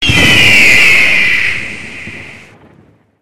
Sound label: screech